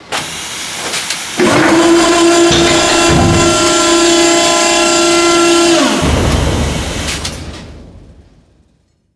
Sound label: arm open